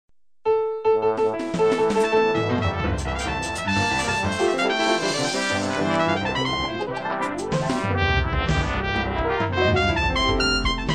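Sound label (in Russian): Настройка малого оркестра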